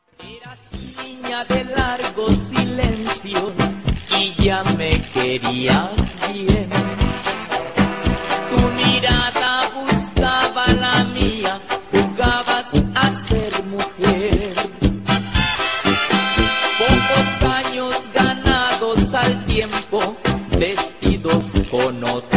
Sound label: banda ringtone